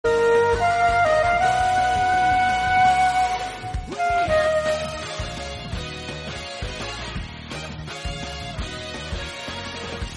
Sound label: Flute Ringtone; One Piece